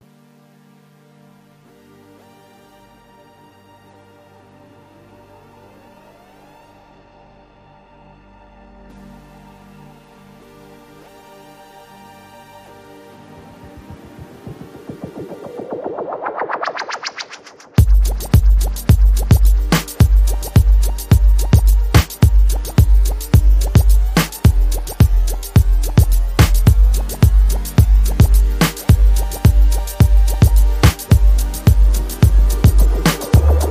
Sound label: Robastep, No Photo Clicks & Birds & Siren